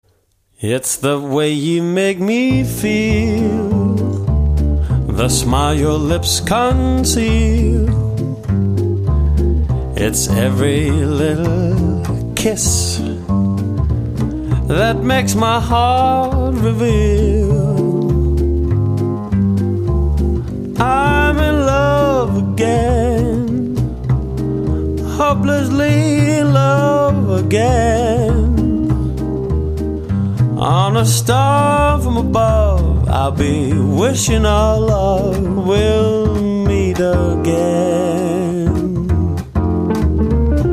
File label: Groovy House, male vocal